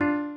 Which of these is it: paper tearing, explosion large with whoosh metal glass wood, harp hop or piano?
piano